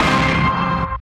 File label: RETRO HIT; Spectrasonic Distorted Reality 2